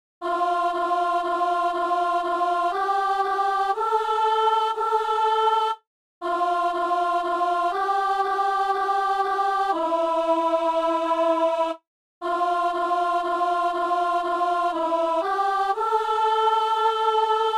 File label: alto; Avant la fin de la lumière